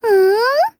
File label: aww; celica